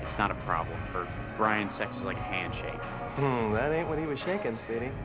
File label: handshake